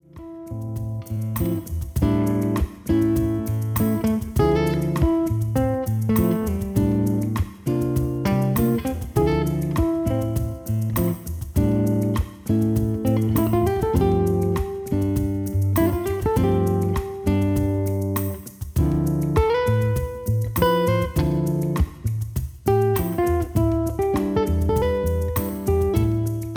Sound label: groovy loops